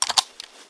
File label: awp clip hit, weapons; csgo; ro2 k98; css